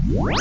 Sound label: POWERBAL; WINPAC